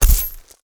chainmail impact

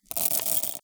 black pepper glass jar

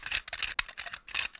lock

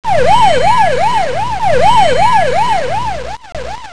AMBULNCE

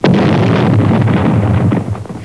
CRASH

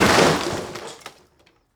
wall crash

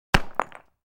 stone concrete drop

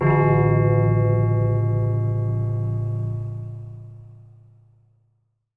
town clock

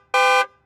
car horn

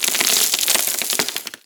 gravel rocks fall

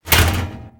metal lid bang

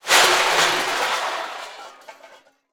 cooking pans drop

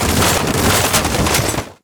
metal debris

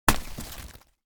snowball hit

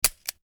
revolver reload

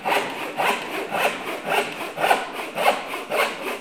hand saw

